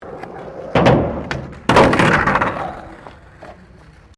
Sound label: SKATEBOARD HALFPIPE WOOD CRASH; Skateboarding; Sports